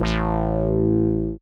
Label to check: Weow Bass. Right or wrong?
Right